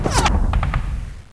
ric, battle

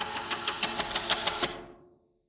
flip sign

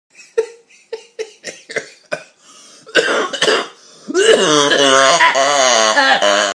lol cough

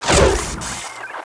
rail launch, weapons

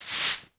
sweep

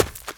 Player Run Dirt

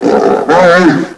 were die, werewolf

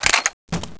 psg clip out

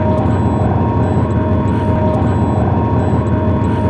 ship engines loop

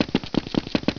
steps

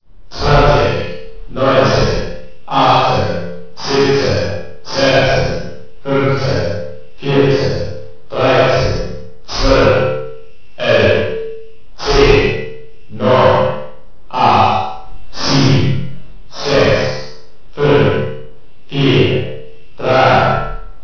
countdown; battleship end

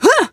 jump, female

